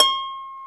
harp

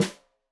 Snare Stereo, Drums; Deeply Sampled Drums; SMDrum

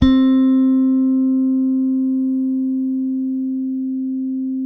bass, sustain; Sampleheads; Will Lee Bass Library

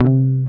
GTR TRIK, Jazzistic; Univers Sons

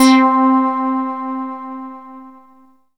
METLB, synthesizer bass; Synthesizers; Soli-Music; World of Synthesizers VOL-1

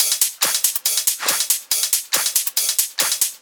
drum Loop 140BPM Full

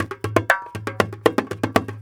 Dumbeck, Percussion; Sonic Foundry (Sony Creative Software); World Pop

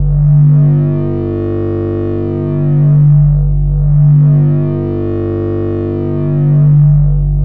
Drone Static; Drums & Drones

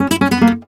f#, Flamenco Guitar Picado; Picado 1

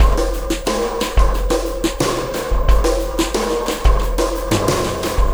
Oscillation Beat

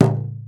LOG, INDIAN PERC; Big Fish Audio - Didgeridoo and Other Primitive Instruments

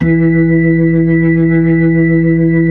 B-3, Blues

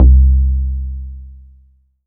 Single Electric Basses, Dance Floor Bass

